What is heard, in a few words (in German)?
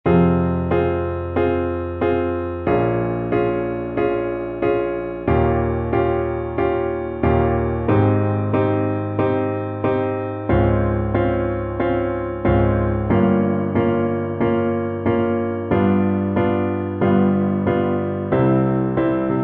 Ave Maria (Saxophon Solo + Klavier-Playback)